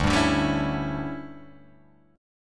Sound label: D Major 7th Suspended 2nd Suspended 4th, chord